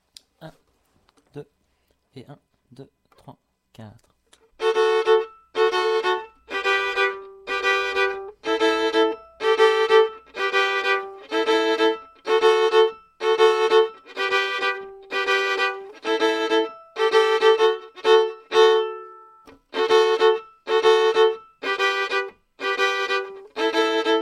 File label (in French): accompagnement de l'anglaise, violon